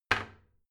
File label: toilet seat slam soft; haus-garten; badezimmer